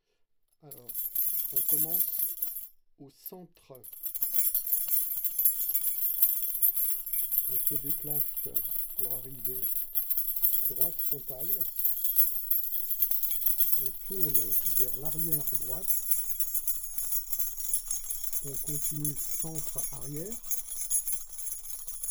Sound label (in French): Deplacement binaural